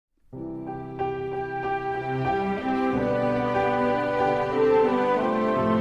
Orchestral

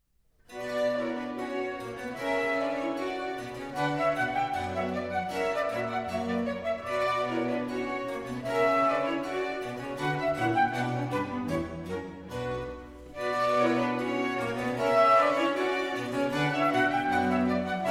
Orchestral Suite in B Minor, Rondeau; BWV 1067; La flûte enchantée

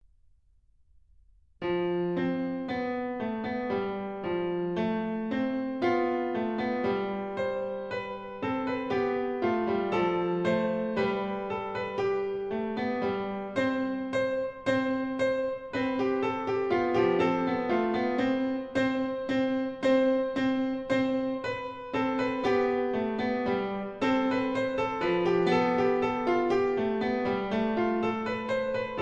In Lydian Mode, Piano; Book 2; Mikrokosmos, Sz. 107; Bartók; Complete Solo Piano Works

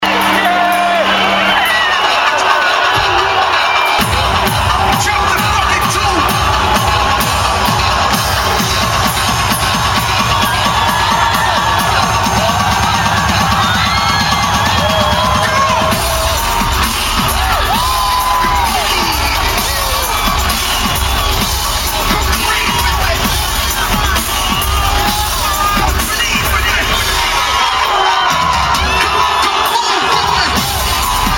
dubstep; Live @ International Exhibition Center, Kyiv, Ukraine (07.10.2009)

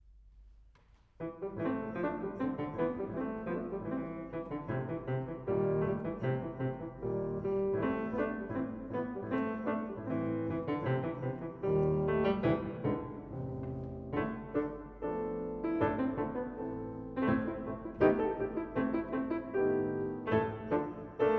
Folk Songs From The British Isles For Piano; Grainger

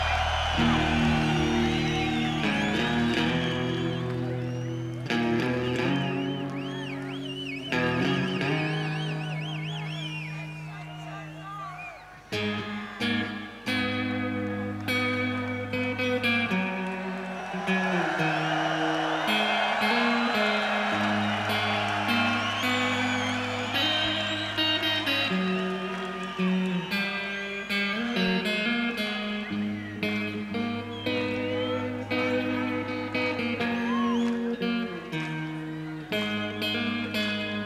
Guitar Solo (Live; Live at Donington Park, Castle Donington, England 26th August 1995

